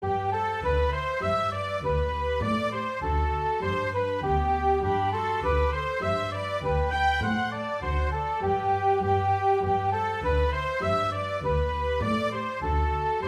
American Reels, This is an arrangement of 5 traditional American reels. Each is 16 bars long with two; Each is 16 bars long with two sections of 8 bars, each of which is repeated; folk band